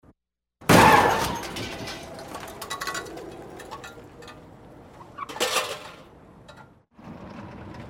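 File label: impact, debris rolling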